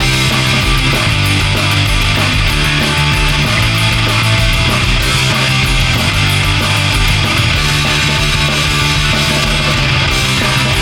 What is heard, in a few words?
Loop; XGames Snowboarder